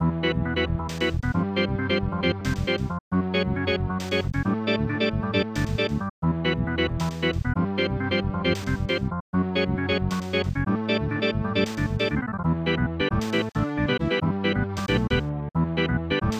Erupting Volcano; Dottie Dreads Nought; SNES

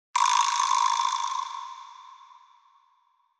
Rattle Single; Bud Spencer & Terrence Hill: Slaps and Beans

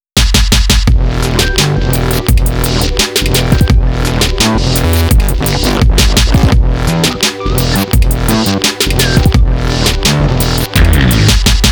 Continue; Tekken 7 Original Soundtrack Vol.2

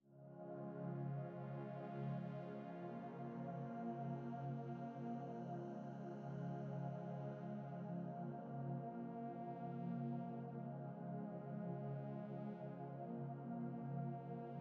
Desert Music Intro Loop

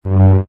Vacuum; Angry Gingerbread Run

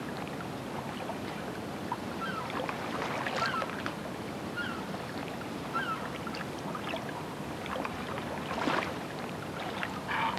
Lake Fine Low Birds and Low Water

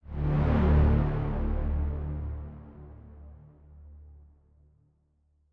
Chime; chime sharp

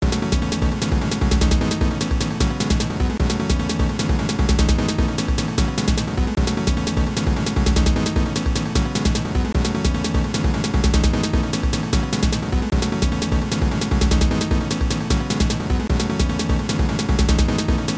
Boss Music; Speedy 2020

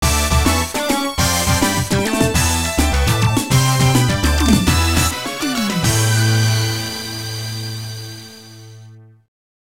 Practice Start; winning run suzuka grand prix namco system 21 arcade gamerip 1989

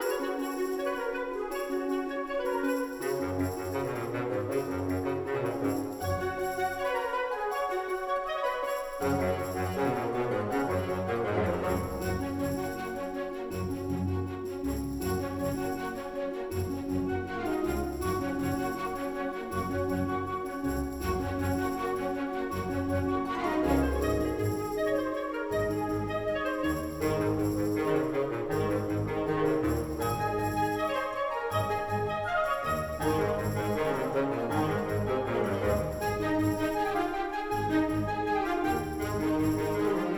Joyful 80bpm Loop; asterix obelix slap them all 2; Music Dialogue

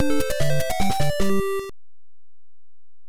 Stage Failed; magic kid googoo; nes

